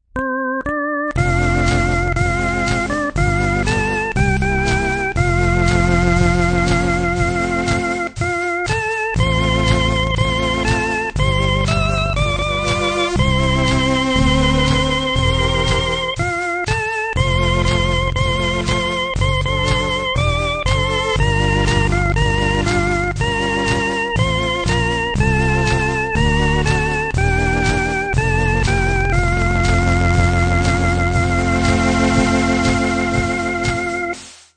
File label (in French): Orchestre de varietes